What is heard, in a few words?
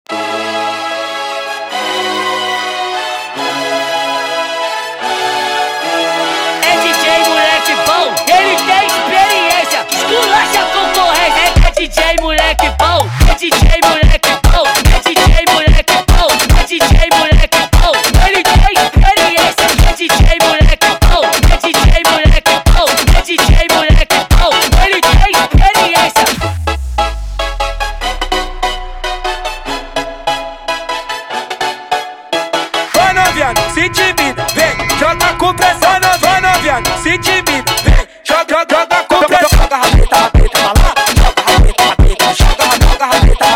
Funk sped Up